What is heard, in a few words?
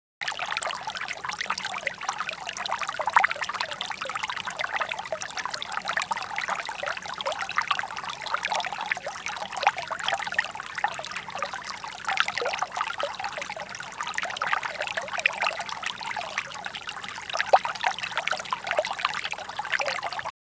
water stream small; best service Blue Box